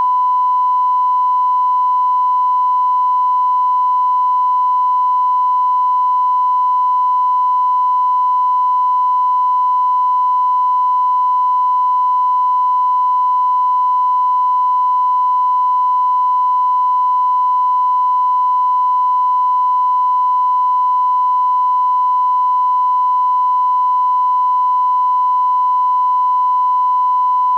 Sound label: 1 Percent THD Sine Wave